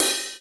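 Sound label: Cymbal; Ableton Foundation FreePacks; Foundation Drums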